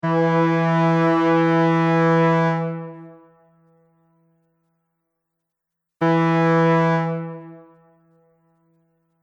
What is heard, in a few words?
Ship Horn 2 Bright Sounds; Boat Sound Effects